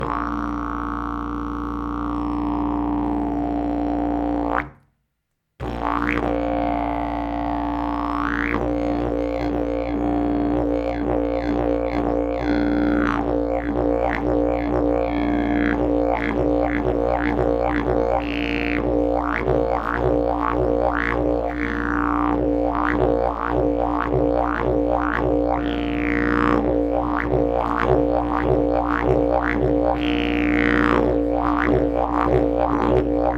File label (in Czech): jasan ultrabass profi, didgeridoo